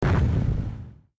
ship explosion

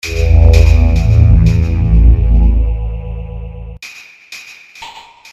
music; lootcamp

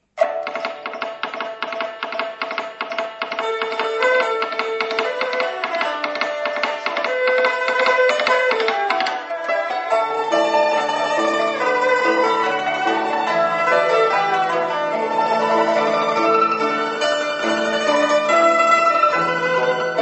song

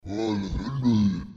grunt

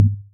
bump

